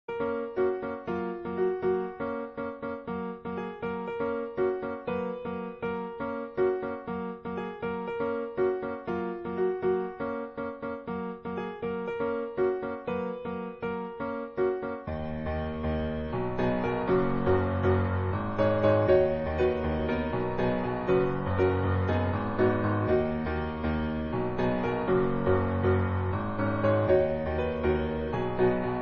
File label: piano cover